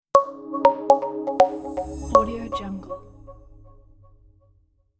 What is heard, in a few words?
tech logo reveal